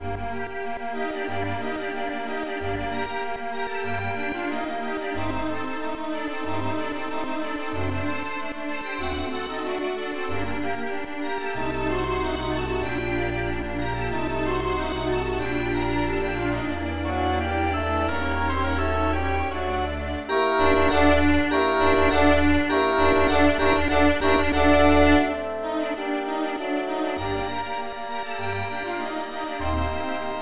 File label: opening in 55 edo; mozart symphony 40 k550 g minor 1